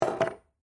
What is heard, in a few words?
Skeleton Key Down, Set; Foley; Jangling